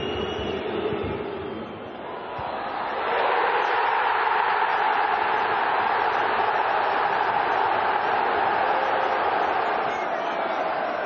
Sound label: Crowd Chanting